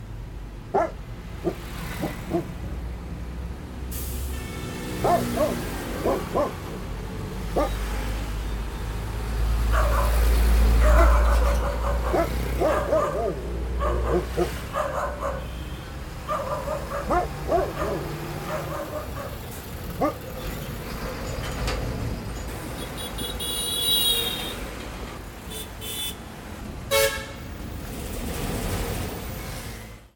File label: Truck traffic honking and barking